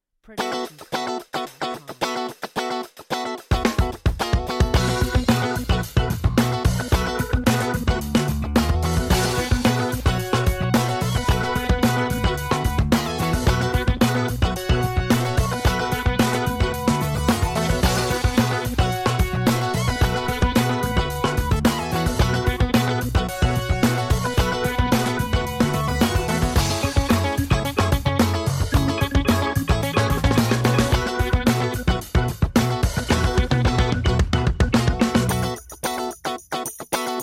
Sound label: Funk; The Funkodrome; SoundsCrate